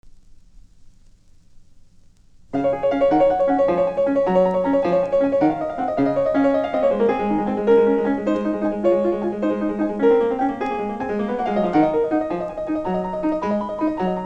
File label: prelude in C sharp major, mono; Bach; WTK 1; Glenn Gould; Reissue on the 1955s USSR Accord